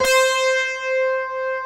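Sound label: strings guitar; Optical Media International - Sonic Images Library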